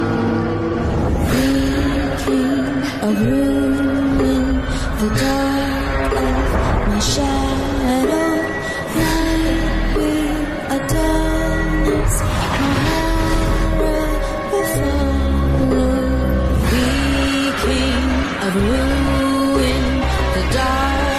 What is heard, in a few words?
Malayalam Villain BGM; Forensic